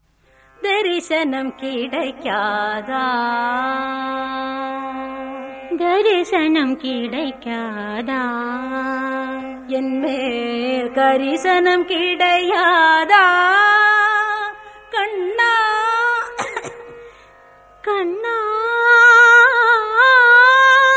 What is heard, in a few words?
Female; Tamil